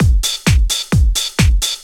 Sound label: drum 130 straight; Techno Trance Essentials